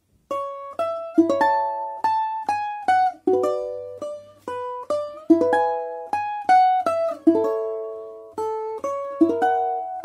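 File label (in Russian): балалайка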